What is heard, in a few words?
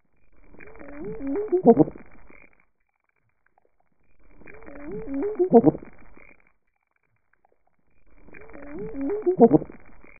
Mystery fish Hawaii